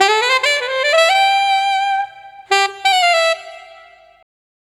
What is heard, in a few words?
Sax Lick; Drum & Bass